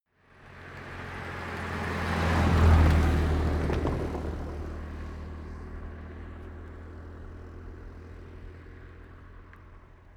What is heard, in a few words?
car drive by rattle